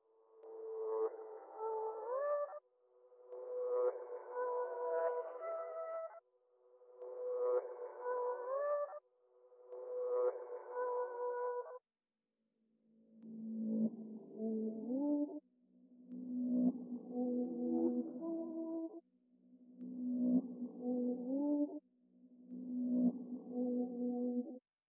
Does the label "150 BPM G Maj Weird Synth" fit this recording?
Yes